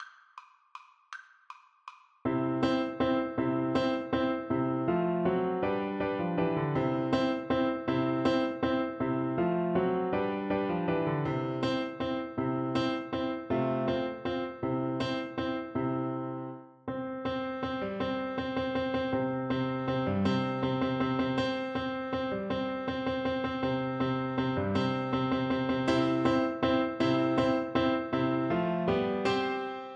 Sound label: autumn, Flute